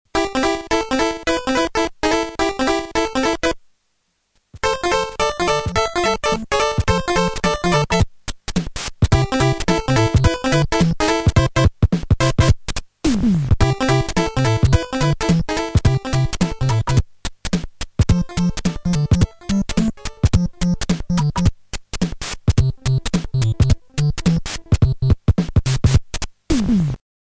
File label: saperate outputs NES